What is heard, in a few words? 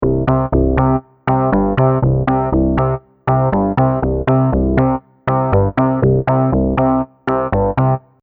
JD Bass Unison; Lush